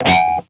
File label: bad note; Clone Hero